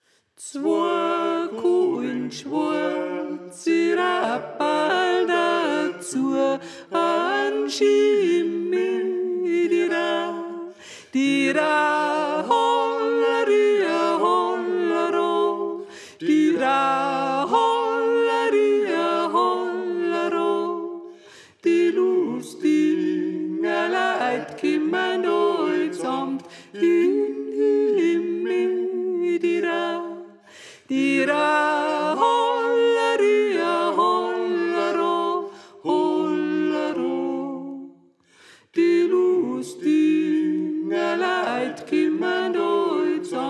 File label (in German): Sopran; Zwoa Kohlnschwarze Rapperl